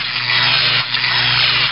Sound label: blast arc